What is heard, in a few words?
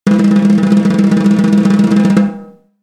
drumroll